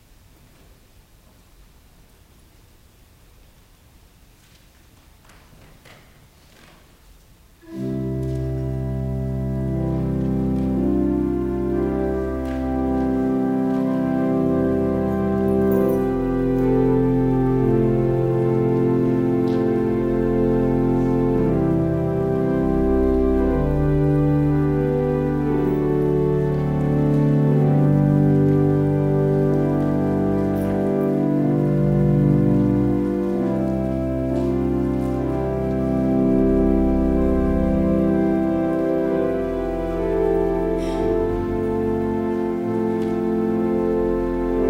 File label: Organ Music